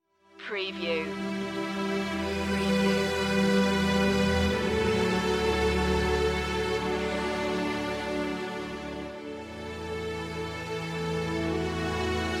sanctus orchestra, religious

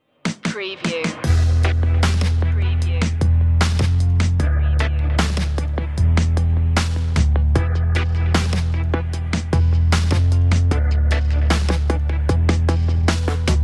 urban jungle underscore; abrasive

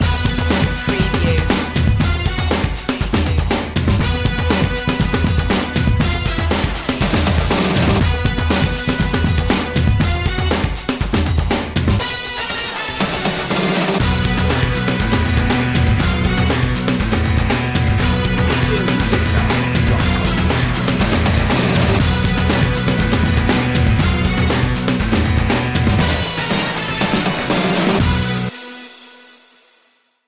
thunder cane, horn section

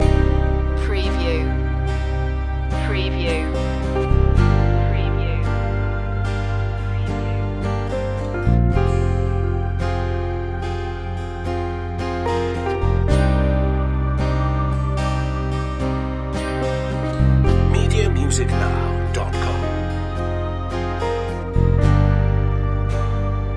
landscape colors loop; pop

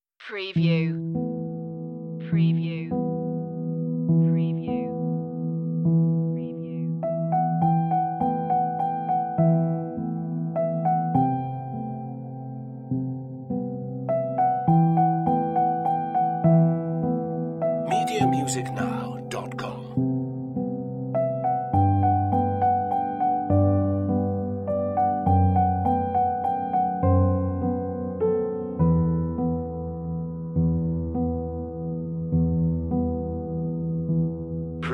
raindrops piano; romantic nostalgic

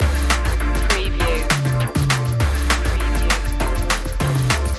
loop; race

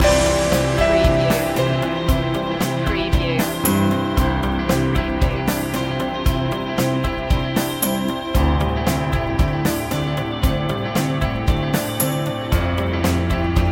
loop, Rock Ballad / Light Rock

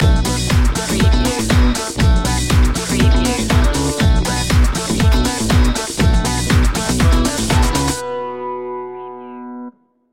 loop ending, Funky / Groovy

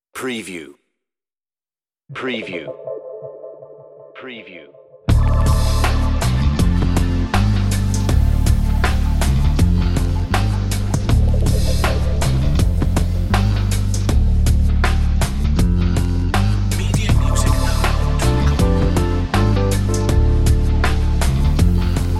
Internal Affair, on-hold music

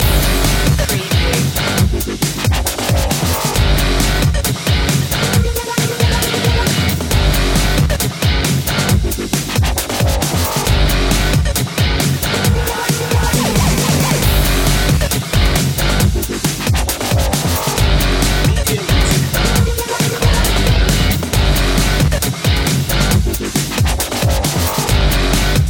cage rage loop; techsport

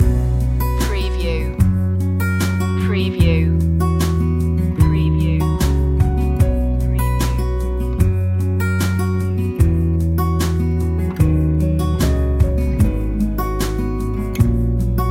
loop; female vocal

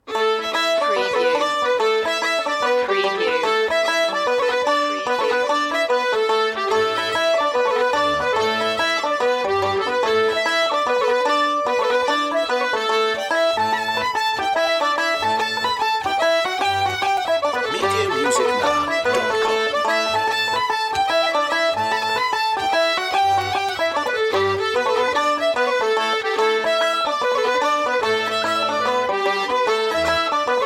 no bod no flute, folk dance; o keefes brosna

